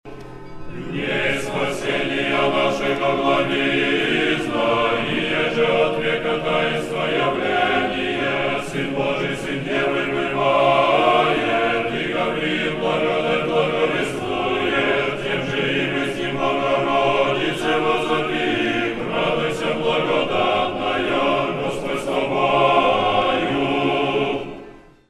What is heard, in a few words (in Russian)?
Hor Tropar Blagovescheniya; Hor Moskovskogo Sretenskogo monastyrya